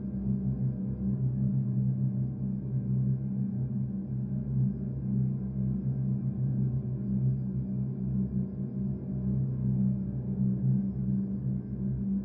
Drone low ominous waivering hum with faint grinding